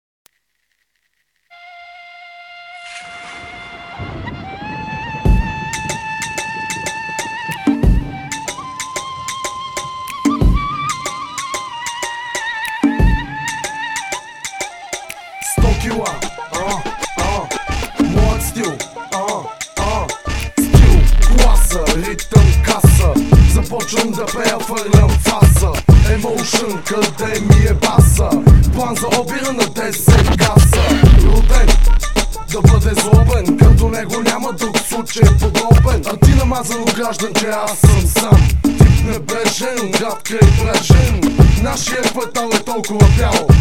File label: bass rhythm